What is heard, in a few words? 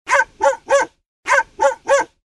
dalmatian sound